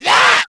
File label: kid zombie stun; nmrih; childrenofthedead; zerosiki; slender